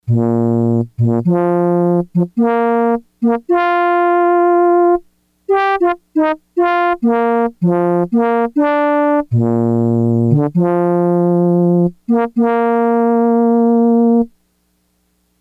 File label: Flugelhorn, Yamaha DX100; Factory Patches